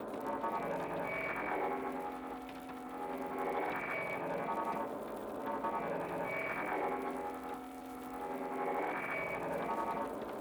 Exhaust Crystal Idle; TANK; 3Souls

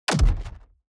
environment gold block destruction kick

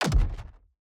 environment block destruction kick

